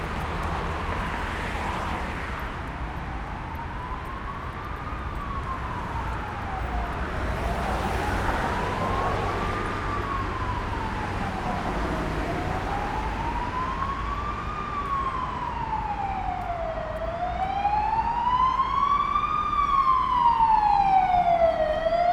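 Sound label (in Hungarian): mentő, Váci útról Róbert felé tartó, mentőautó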